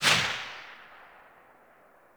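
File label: submachine gun Tail; Warframe; Tenno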